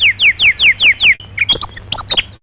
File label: Bird; Fairlight CMI IIe